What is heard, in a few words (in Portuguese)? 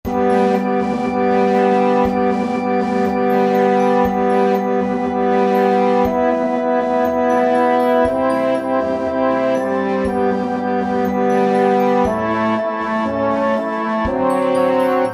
trompa bb